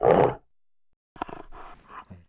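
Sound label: Bear Fidget Vox; Civ4 warlords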